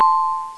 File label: Chime; SpreadBase